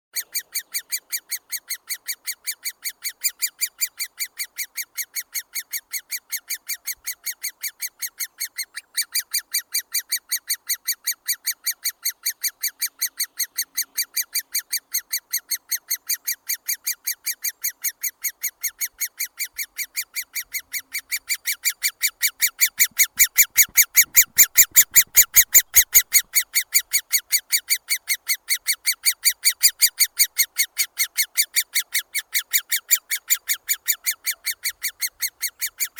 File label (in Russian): dyatel bolshoi pestrii dendrocopos major